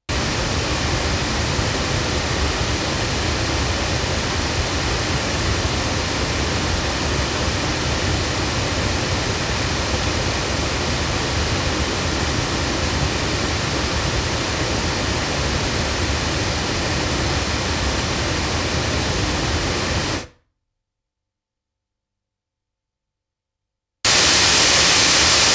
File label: sE R-1 loudspeakers, microphones; se electronics